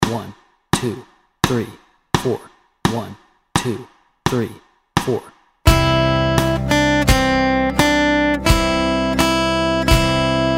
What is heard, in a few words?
Mary Had a Little Lamb Melody and Chords Track, guitar